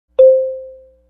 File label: chime